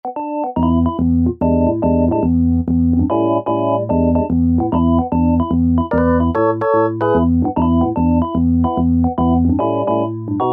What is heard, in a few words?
CX3, Old School Keyboards; Universal Sound Bank; Soundscan 09